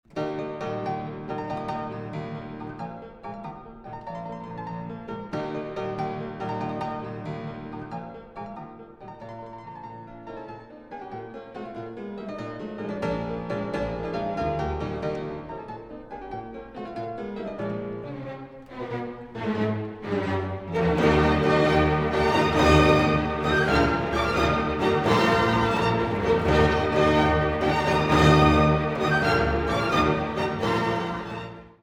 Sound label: Piano Concerto No.5 Emperor; Beethoven